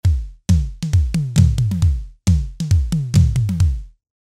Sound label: Seq Memory, Tama TS-305